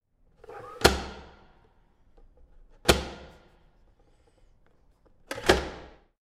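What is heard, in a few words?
Prison Cell Door Shutter Slide, Peephole sliding open and; Open and close; heavy cell door x3; Prison Door Sound Effects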